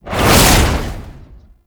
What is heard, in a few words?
Shield Drone Throw; Enemies; Abilities; Warframe; Tau; Dax Medic